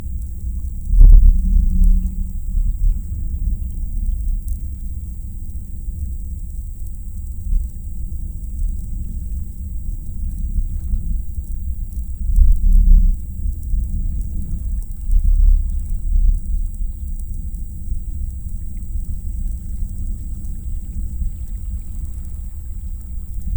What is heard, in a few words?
Hydrophone